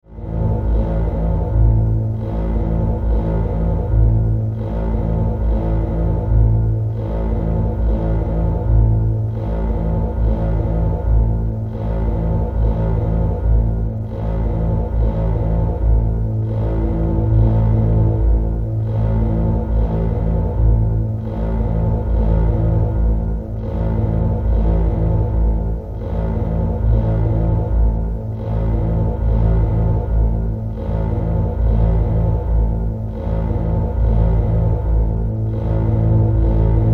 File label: Drone, Synth, Pulses, Scifi; 5.1; Sound Effects:Science Fiction; BlastWave